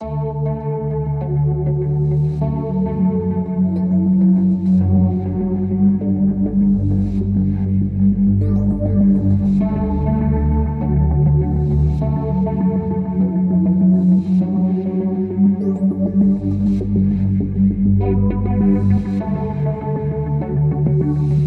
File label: Drums